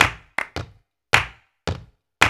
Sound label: 108 CLAP, World Dance; OZ!